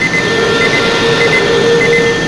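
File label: ambience klaxon; cruisecontrol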